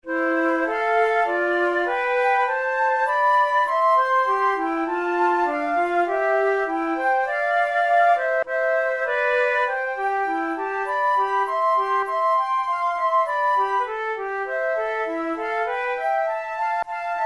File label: duets, flute; kohler